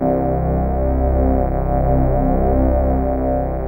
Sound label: SYN Morp C3; Best Service Gigapack 1